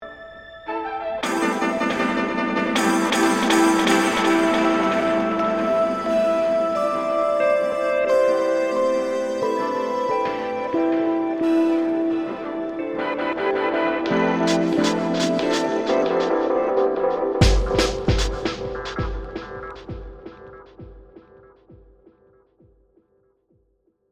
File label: Sample Triggering